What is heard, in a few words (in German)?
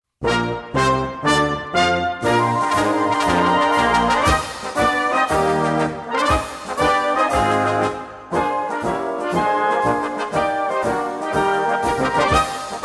Marsch